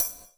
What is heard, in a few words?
GATE PERC, hi-tech kits; Crypton - DrumBase5000